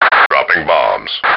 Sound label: dropping bombs